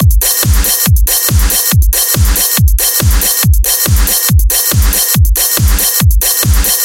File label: Drum